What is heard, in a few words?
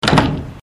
door